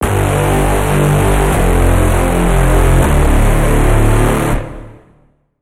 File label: Braam; Annihilator; Routed Sound; Vital Expansion - Quantum Enforcer Vol.1